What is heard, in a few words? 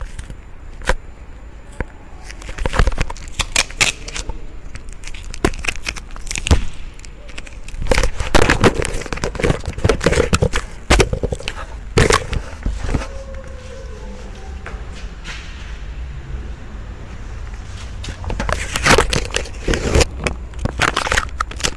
Suzuki Splash noises when turning wheels